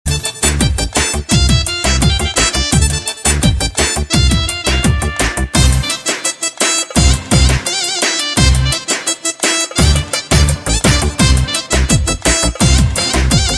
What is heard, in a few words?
Balkan Nokia; Ringtones